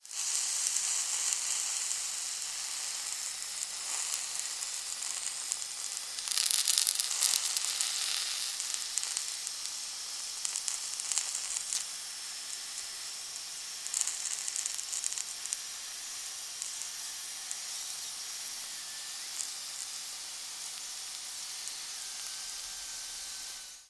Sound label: sizzle in the pan; salamisound